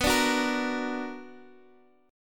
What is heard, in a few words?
B Minor Major 7th B Guitar, chord; BmM7 strummed; 18,17,18,15,x,x; 4F,4A,5C,5G,5C,6E; JGuitar